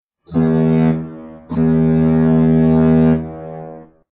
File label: Boat Horn Sms